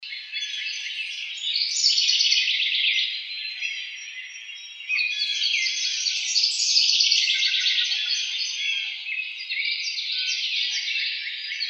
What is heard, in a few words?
House Wren